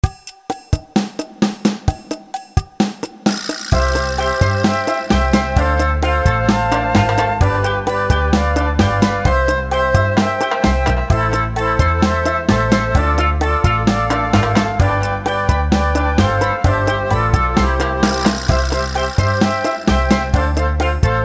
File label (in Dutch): Fluitboek Flute Calypso; Flute Time vol.1